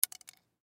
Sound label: Straw Drop Fast; Miscellaneous Bar Sound Effects; Foley; Blastwave